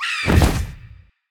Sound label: enemy harvester death; sfx